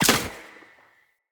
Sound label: Bow Shot; Weapons; Josalfar Bow